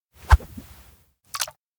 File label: cast line; anemoi